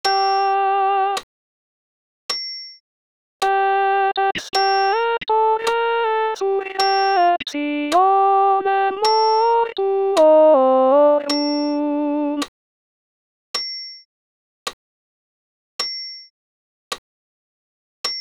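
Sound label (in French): Credo alto voix seul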